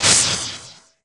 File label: x jump; MMX4SFX